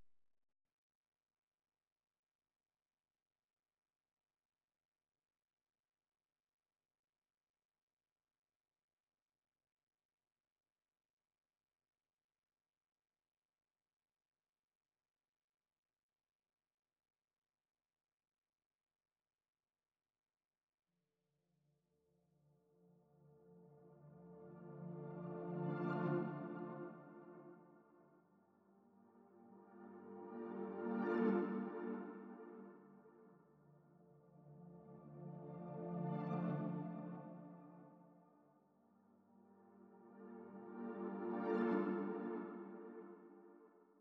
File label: 92 BPM D Min Reverse Keys; Melody; Loop Stems; Cymatics; 2022 Melody Collection Platinum Expansion - Pt 2; Top Floor